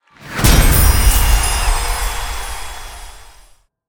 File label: win large; superstriker